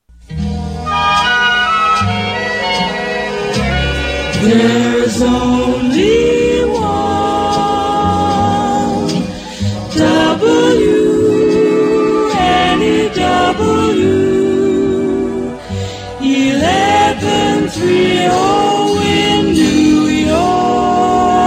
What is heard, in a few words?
Radio Jingle; WNEW AM 1130 NY